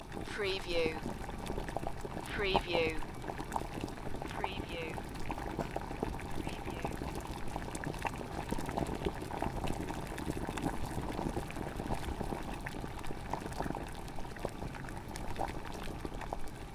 Boiling Water Loop

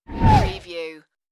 Wipe

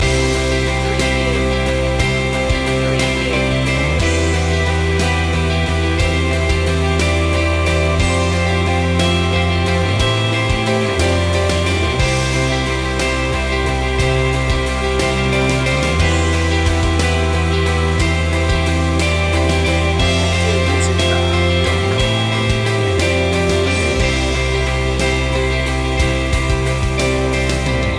over and over loop

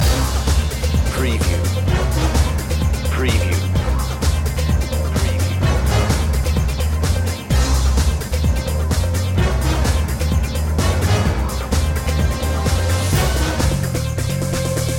sym groove loop

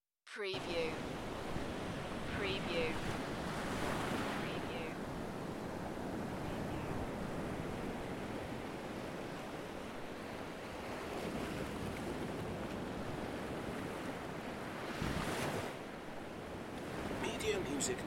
Spanish waves at night; beanstalk audio

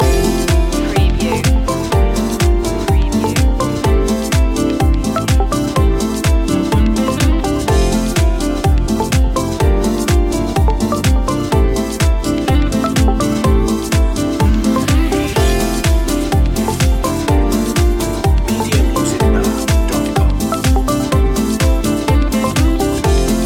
summertime beats loop

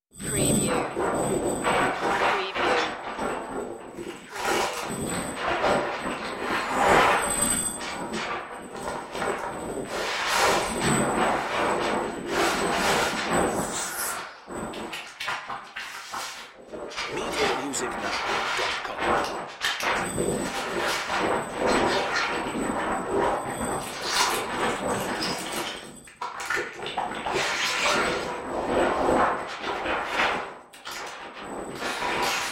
creepy hollow ambience

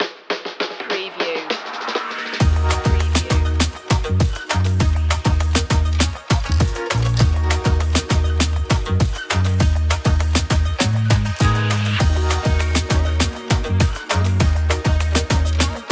cool jamaica style

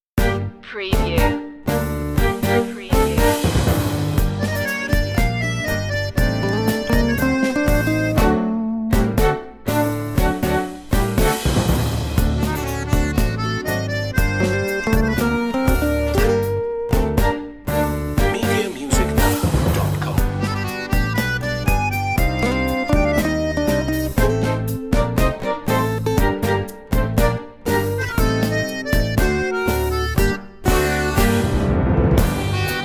tango passion

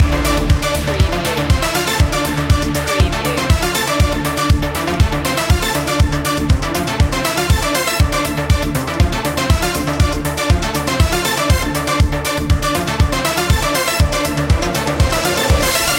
arp loop

